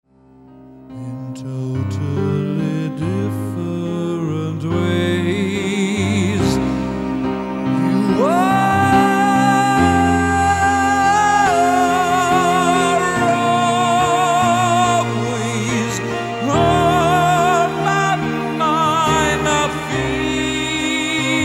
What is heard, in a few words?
Childs song